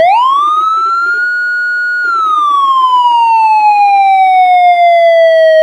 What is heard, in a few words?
wail, sirens; code3 rls